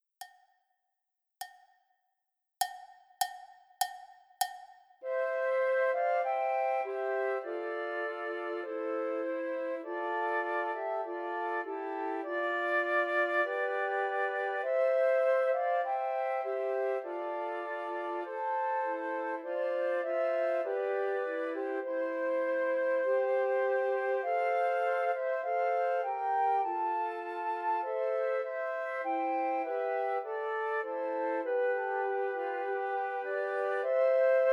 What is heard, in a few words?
Trios for Three Descant Recorders